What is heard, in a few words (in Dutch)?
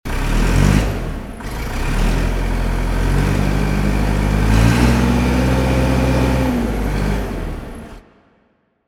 Tractor Motor